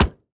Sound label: environment hammer hit